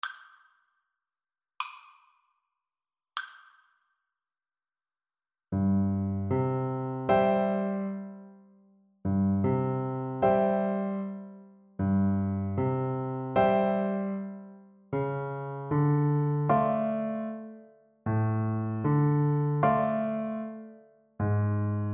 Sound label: Oboe